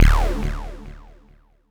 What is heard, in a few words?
Synth Hit D